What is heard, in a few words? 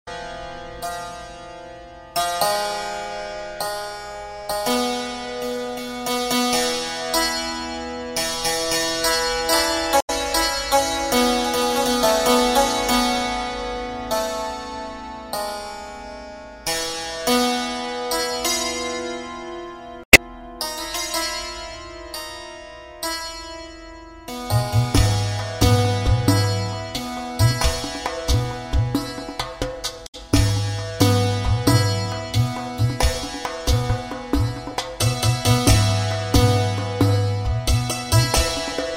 Persian Santur; Precisionsound